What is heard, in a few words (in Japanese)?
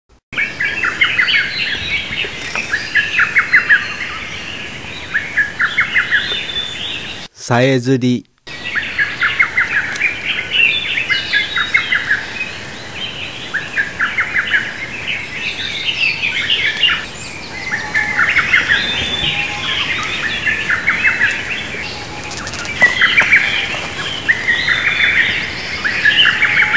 hototogisu; bird; azamidani